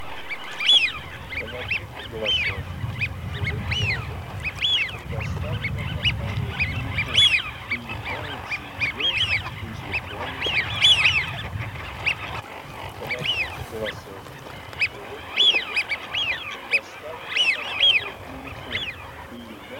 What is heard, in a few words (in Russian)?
Свиязь Fischione; plurifon